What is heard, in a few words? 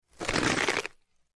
Bone Crunch Large Bon; bites